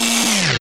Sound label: sfx door close